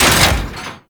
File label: Gatling Gun Dry Fire; Warframe; Tau Gatling Gun